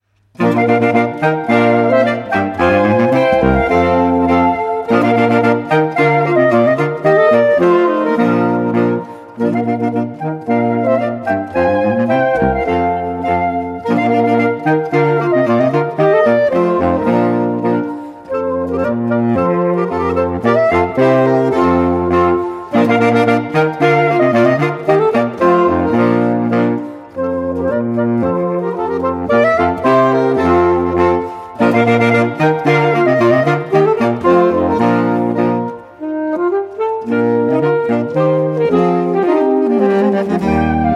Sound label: Old Hungarian dances Intrada live opname